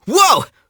"woah!"; sonic; vc

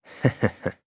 hehehe; byakuya

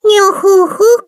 belly rub; usami

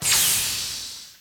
breakdown; sorin

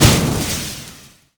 shoot; sonic

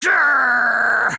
grrr; monokumav3

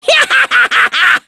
hyahahahaha; monokuma

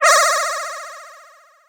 teleport special; sonic

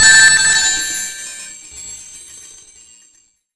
ring spread; sonic

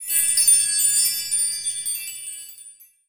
sparkle

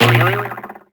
spring pole; sonic

